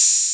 studio open hi hat sample a key

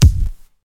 reverb kick drum sample g sharp key

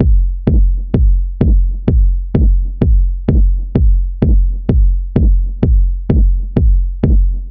house techno beat passage, fm, 128; drum loops

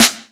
sizzly acoustic snare a sharp key

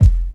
dry bass drum single shot f key; kick drum samples

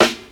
nineties warm hip hop snare sample f key; snare-drum-samples

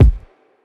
fresh dope kick single hit A key

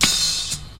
reverb open hat sample C key

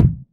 short steel kick drum sample c key

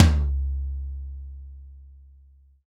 hi tom drum single hit c sharp key

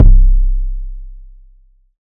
long bass drum single hit C key

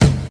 clear kickdrum sound c sharp key; kick drum samples

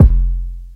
tight low end kick f key

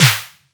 snare single hit b key

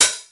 muted hi hat closed sound g key; hat samples

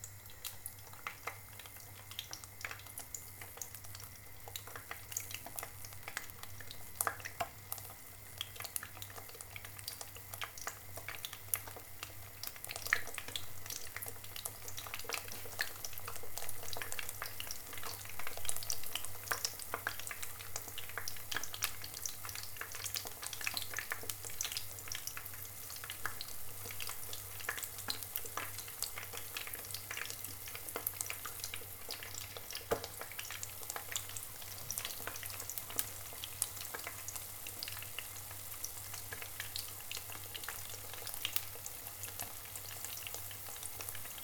frying an egg sound

